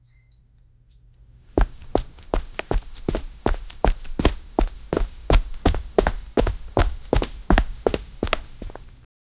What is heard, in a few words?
footsteps of running